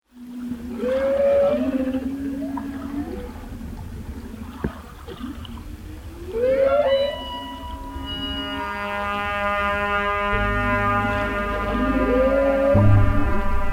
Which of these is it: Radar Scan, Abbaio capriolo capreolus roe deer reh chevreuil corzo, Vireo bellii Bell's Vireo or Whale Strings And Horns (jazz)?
Whale Strings And Horns (jazz)